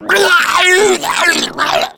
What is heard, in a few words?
female boomer vomit; voice